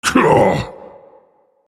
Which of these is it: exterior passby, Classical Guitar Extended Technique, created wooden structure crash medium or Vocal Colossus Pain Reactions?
Vocal Colossus Pain Reactions